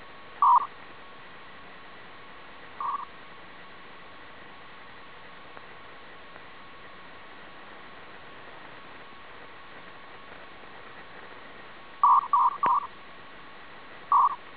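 250 Bd FHSS, SIEMENS CHP-200 ARQ-system in FH mode; SIEMENS CHP-200 FSK-SYSTEM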